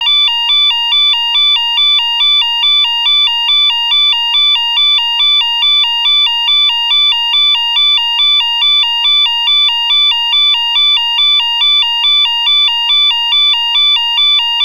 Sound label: DUAL TONE, Piezo Siren